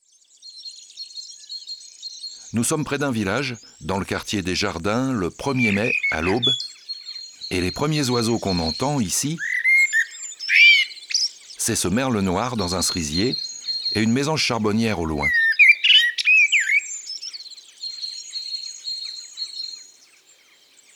Merle noir; abc de la nature

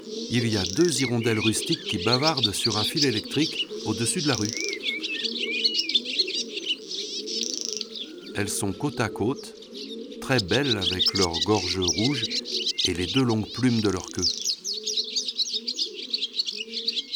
Hirondelle rustique, ABC des chants d'oiseaux

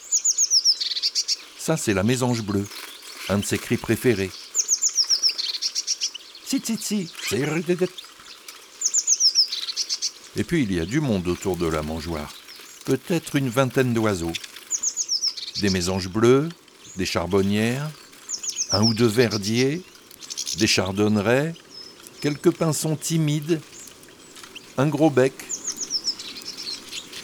Mangeoire Mesange bleue

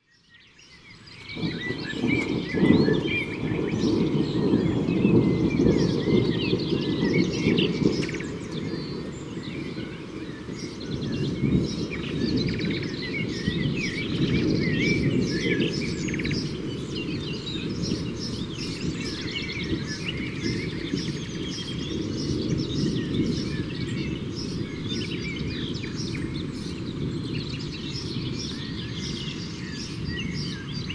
L orage se rapproche, Ambiances Sonores d Ardeche; Valgorge; Studio Les Trois Becs

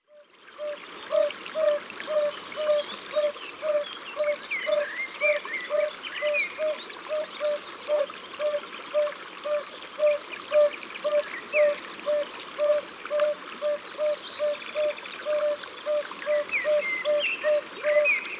Sonneur a ventre jaune; ABC de la nature

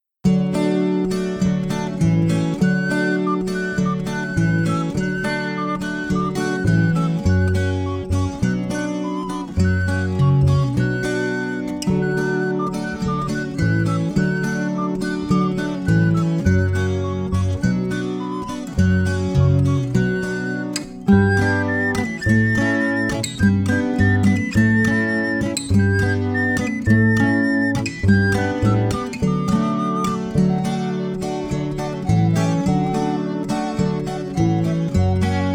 Le chevreuil se balade Version instrumentale

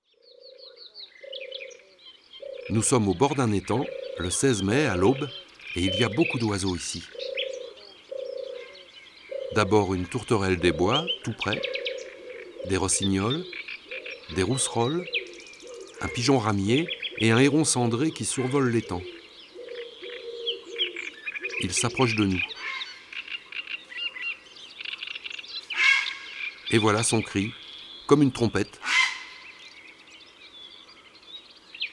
Heron cendre; ABC des chants d oiseaux

